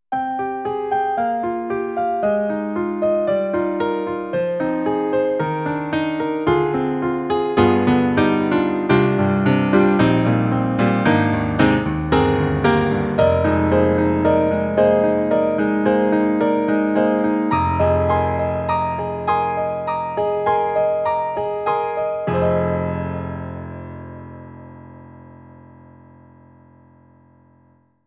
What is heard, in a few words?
EDIROL SD-90, Contemporary, Rock Piano